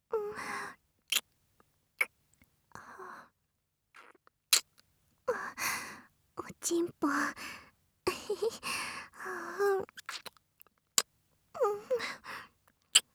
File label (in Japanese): キス